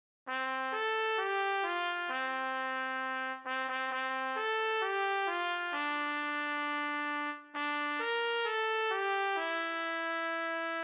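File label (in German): solo Jingle Bells Trompete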